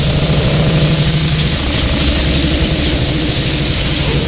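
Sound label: green beam loop; movers objects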